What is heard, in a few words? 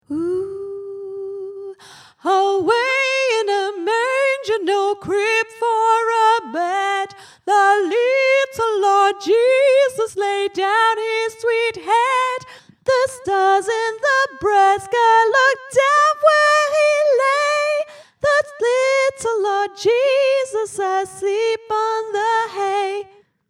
soprano; away in a manger